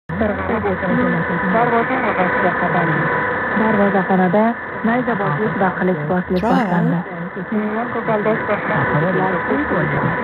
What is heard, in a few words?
15510 drm mandarin; bbc